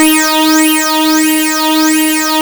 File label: 2600 D#3, ARP 2600; Hollywood Edge - Giorgio Moroder Rare Synthesizer Collection